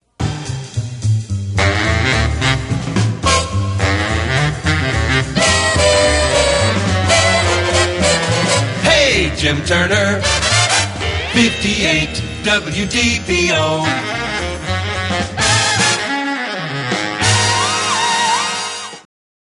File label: jingle; WDBO; 70s